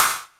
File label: TR kit, techno kit; Pro-Rec - Synthsonic